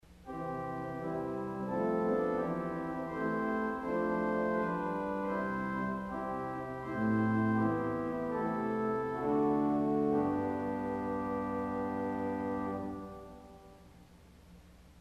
Viola, organ stops

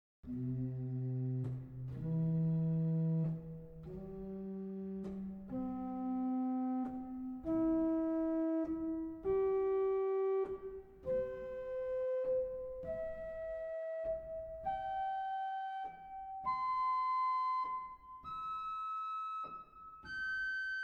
Flute arp; Mainz

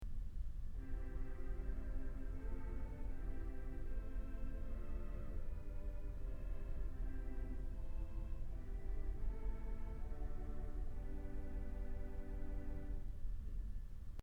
Vox Humana; Kellogg Auditorium

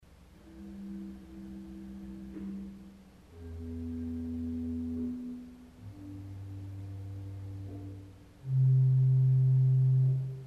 Doppelflote Arpeg, organ stops; 8'; enclosed with Choir; Fabry 2007-08; from Wm. King & Son c.1890; St. Anne; CulverAcademy

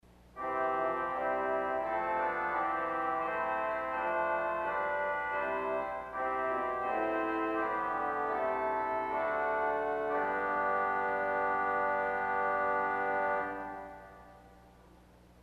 Contra Oboe, organ stops

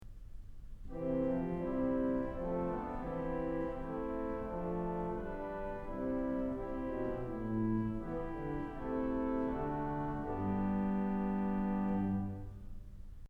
Contra Gamba, organ stops; Kellogg Auditorium

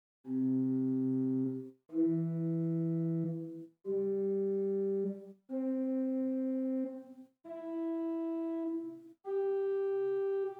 Sw Gemshorn4 arp, organstops

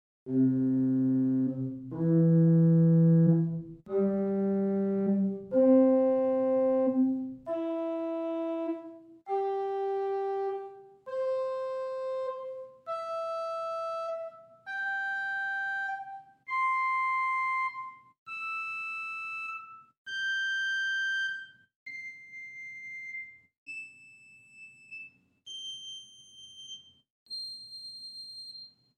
Gt Octave4 arp, organstops

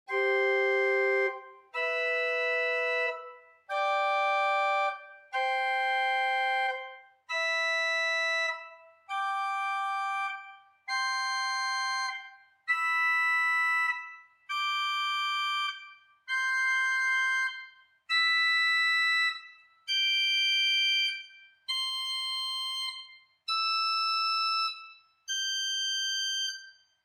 Hw Mixtur arp; Grace Lutheran, Champaign

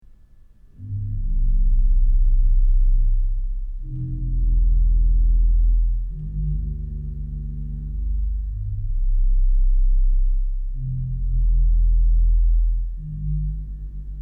Resultant; Kellogg Auditorium

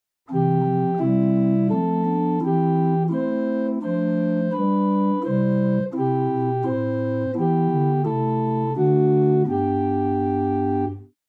Choral Holz, organ; Buzard Opus 3

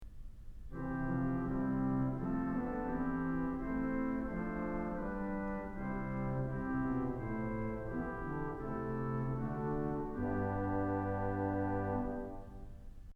Sw Posaune, organ; Kellogg Auditorium

